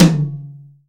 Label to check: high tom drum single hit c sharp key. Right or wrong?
Right